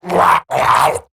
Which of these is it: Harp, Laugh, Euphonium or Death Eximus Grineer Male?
Death Eximus Grineer Male